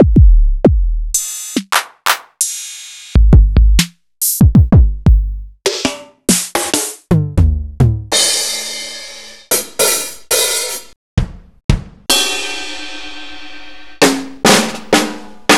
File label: EMU Xtreme Lead